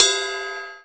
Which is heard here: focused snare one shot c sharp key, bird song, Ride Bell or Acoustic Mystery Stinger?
Ride Bell